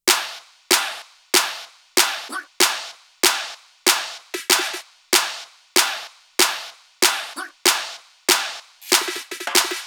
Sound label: Perc Clappy